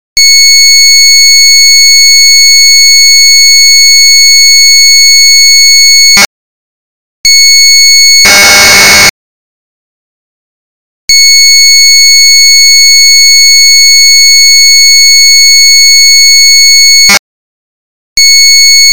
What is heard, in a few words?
2400 baud; caslink2; kvalley rom 16k